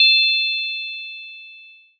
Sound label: glass chime; locksounds